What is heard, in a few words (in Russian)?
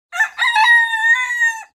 petuh